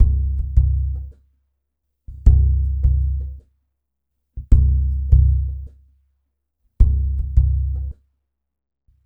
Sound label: WAVE; Spectrasonics; Liquid Grooves 1 & 2; EUPHRATES